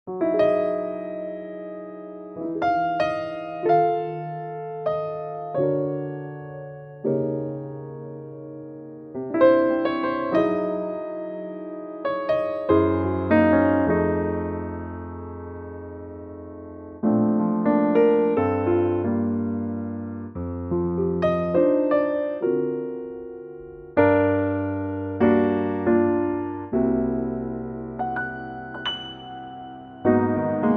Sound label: Bb piano; key - Bb - vocal range - Bb to C; piano only arrangement